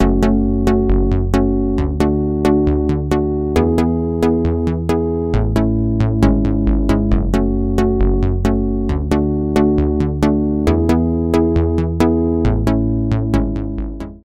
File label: Micromoog 2090 Bass; Bassesland; Virtual Acoustic, Electric and Synth Bass KONTAKT Sample Library for NI KONTAKT; Syntheway